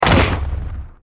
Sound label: hit; csgo